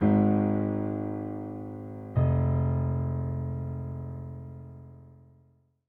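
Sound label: Intro Game F minor Ab F; DeadFright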